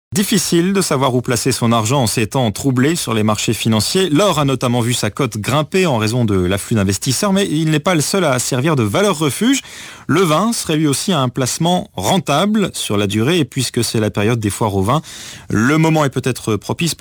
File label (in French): radio classique